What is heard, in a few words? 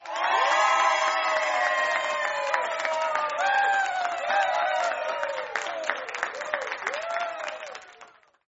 Cheer